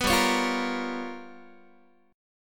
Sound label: G 7th Sharp 9th Flat 5th, Guitar; G7#9b5 strummed; JGuitar